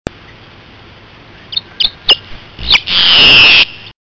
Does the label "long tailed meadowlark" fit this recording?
Yes